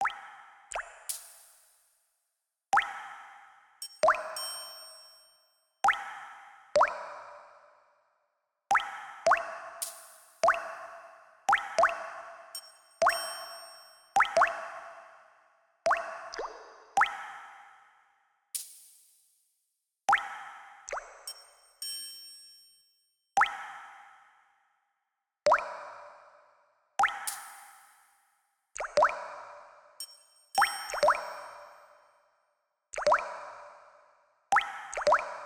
water triangle test freeverb, Water drops + Triangle, reverb